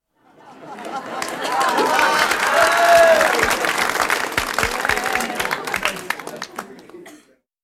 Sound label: theatre laughter and applause; playhouse; Gertrude Stein's Objects Lie on a Table